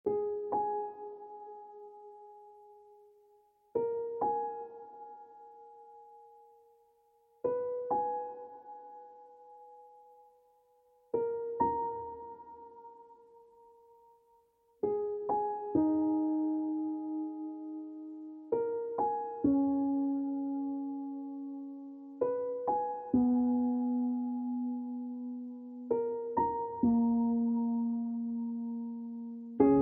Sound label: sorrowful piano